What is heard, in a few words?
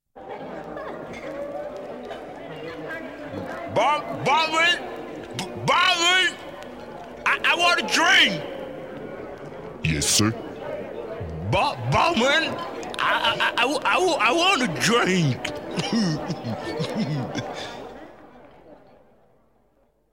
Jingle